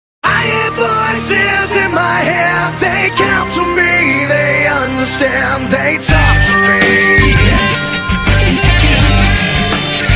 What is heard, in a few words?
theme song ringtone; randy orton